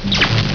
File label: box timer